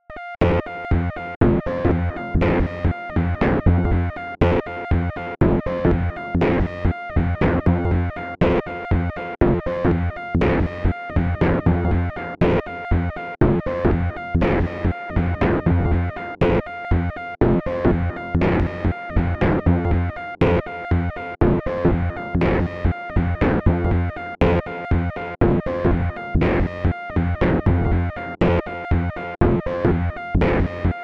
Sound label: Shrimp Beat